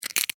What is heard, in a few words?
Insect, Claw, Grab, Snap, Movement, Bug; Insect Claws; Sound Effects:Animals:Insects; Blastwave